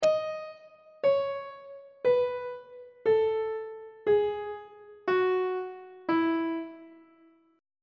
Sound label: f flat ionian mode descending on treble clef